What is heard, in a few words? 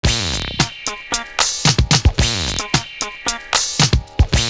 ULTRA FILL, LOOP; Univers Sons